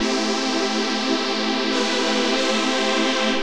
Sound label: TECHNO PAD 140; Keys